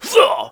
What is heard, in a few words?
gut scream; Rust